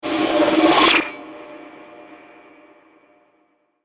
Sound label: Long Echo Strike, hit sound effect; science fiction sound effect